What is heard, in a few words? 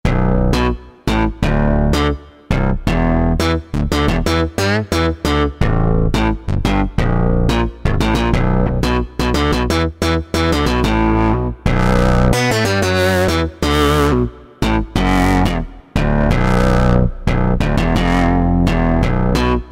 Big Mono Bass, Kurzweil K2000; Kurzweil K2000 V3